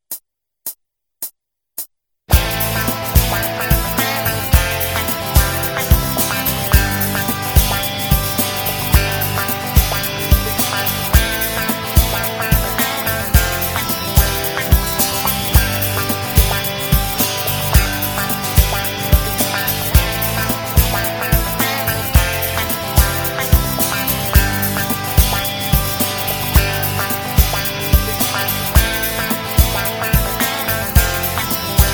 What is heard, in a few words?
Cool easy Jamtrack